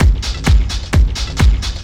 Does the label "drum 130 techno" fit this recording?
Yes